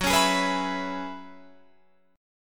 Gb 7th Suspended 2nd Suspended 4th, Guitar, Open F; Gb7sus2sus4 strummed